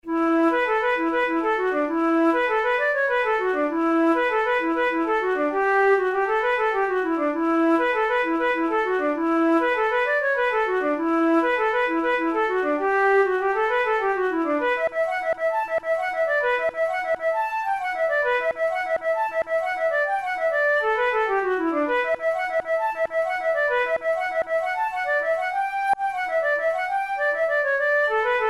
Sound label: jig, flute tunes; Morrison's jig